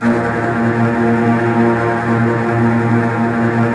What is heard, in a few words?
STR TREMOLO, Strings